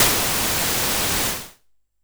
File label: Perc Hit, Noise